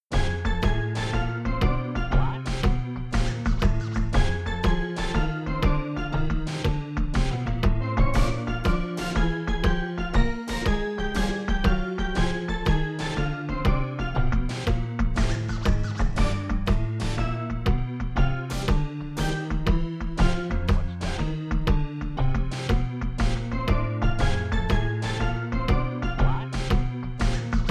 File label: Scratch; Nichijou Scratch; kuro no juusan gamerip